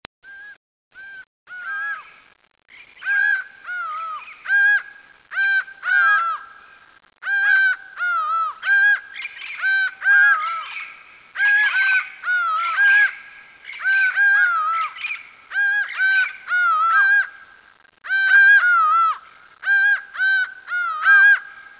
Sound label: laughing falcon